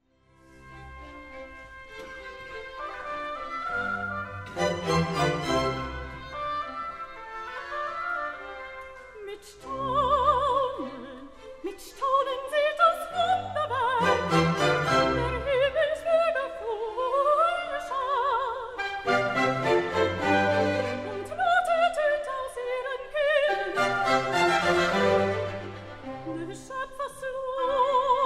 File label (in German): chor